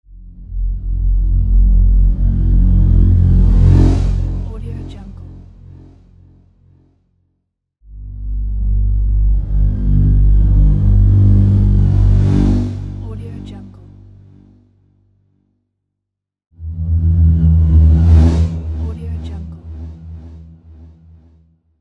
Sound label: sub whoosh; transitions and movement